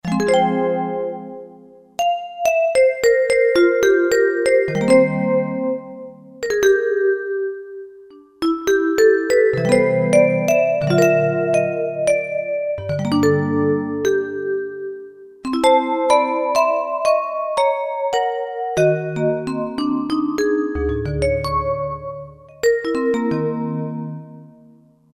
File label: Warm Vibe, Roland JD-990; Factory Patches